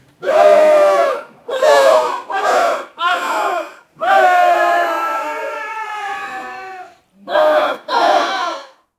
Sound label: other people crying at th, sad